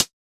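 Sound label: Hi-Hat Hard Closed; Yamaha DJX-2B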